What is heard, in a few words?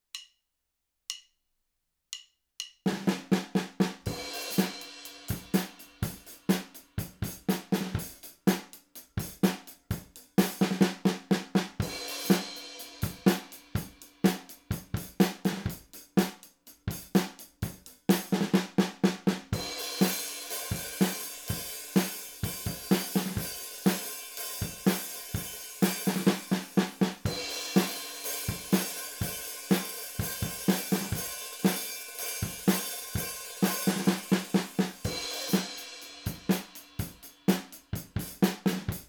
drums V63M, mic tests